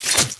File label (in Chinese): 轻攻击命中